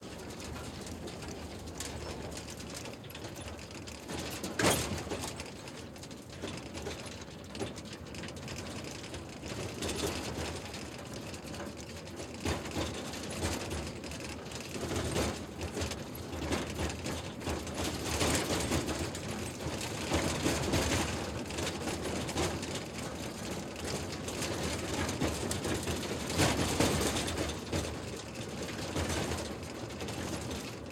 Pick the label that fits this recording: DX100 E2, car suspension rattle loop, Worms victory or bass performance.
car suspension rattle loop